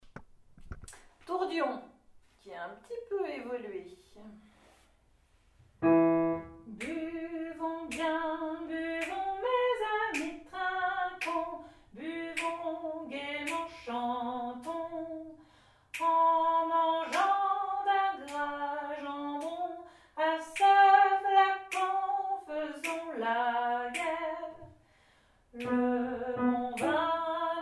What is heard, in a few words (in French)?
TUTTI